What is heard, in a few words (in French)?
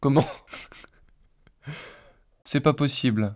rire synthese contextuelle